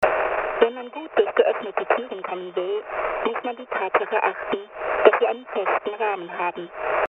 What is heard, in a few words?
radios apollo beta